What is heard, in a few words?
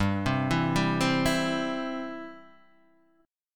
C Major G